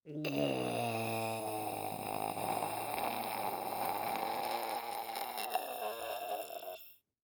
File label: Science Fiction Sci-Fi Alien Growl